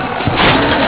laser gun

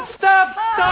sample, male laugh

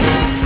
close door

